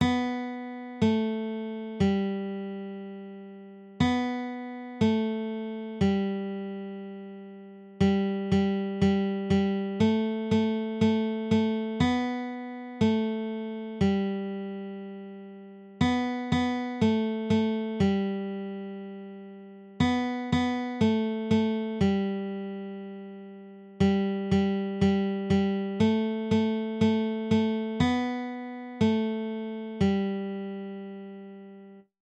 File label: hot cross buns guitar